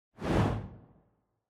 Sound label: Camera Motion Dry; シーン・カメラモーション; ドライ